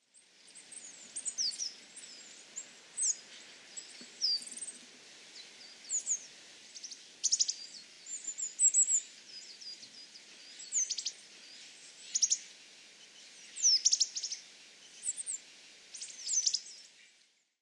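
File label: higara, Coal Tit; chichibu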